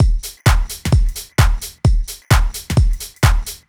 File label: Drum Loop 130, Downtown House; Drum Loops 130bpm